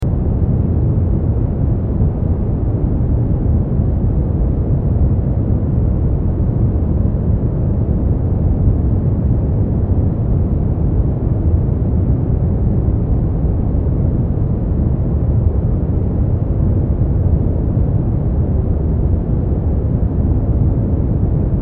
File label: relaxing smoothed brown noise; 「集中できない…」を今すぐ解決！ADHDに効果的なブラウンノイズの使い方とおすすめ活用法